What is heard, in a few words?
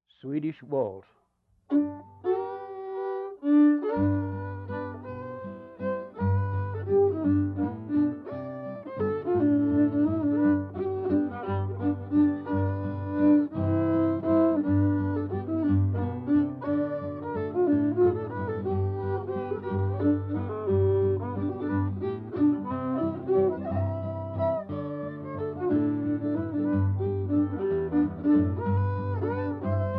swedish waltz